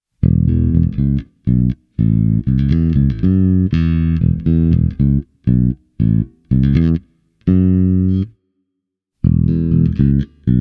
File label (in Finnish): bassot LTD B 50 EMG; Rockway